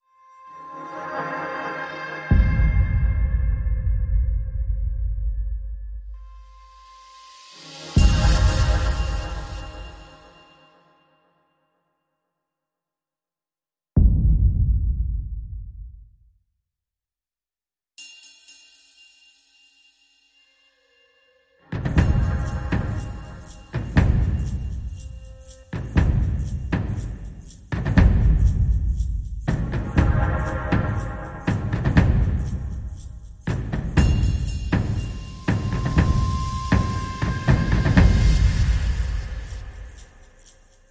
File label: Perc Only